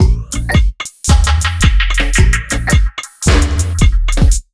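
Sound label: 110 beat, Ragga & Dub Reggae; USB Soundscan vol.27; AKAI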